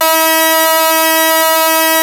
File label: ARP 2600 B4, synthesizer; Hollywood Edge - Giorgio Moroder Rare Synthesizer Collection